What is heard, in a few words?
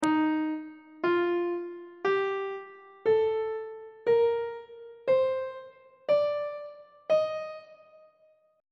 d sharp lydian mode on treble clef